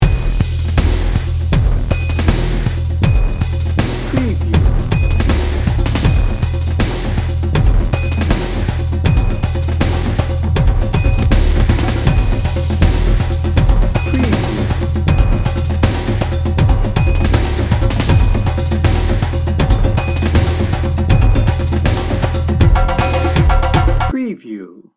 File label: celtic hero dnb loop